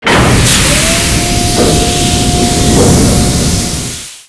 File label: def gun raise; trek